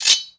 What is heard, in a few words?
player answered; pygame; retrotrivia